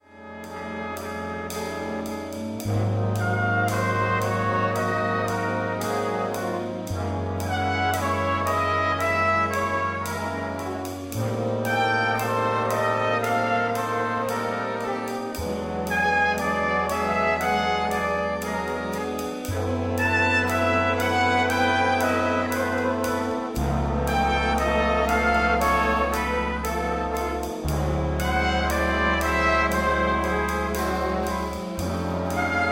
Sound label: Trumpet Spleen